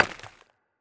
Stone Impact

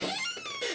House Fall

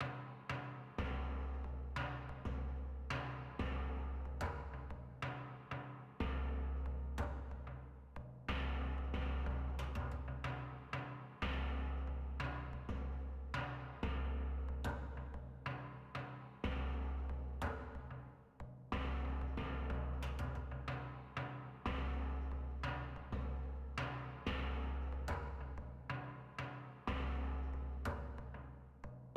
Oil Drum

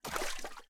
Rod Movement

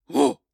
Ruins Great Shield Sentry Attack

